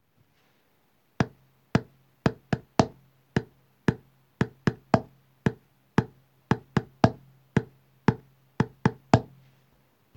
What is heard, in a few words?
rhythm